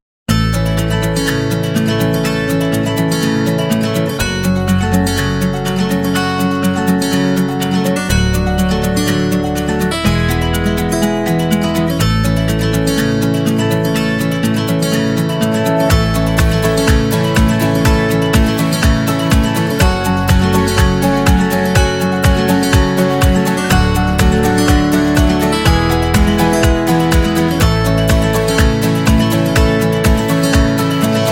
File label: Acoustic Pop